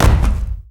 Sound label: spiderbot land, vehicles